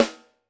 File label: Piccolo, drum